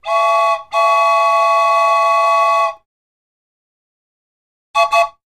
Toy Train Whistle: Double Pitch, From Close Perspective. 2x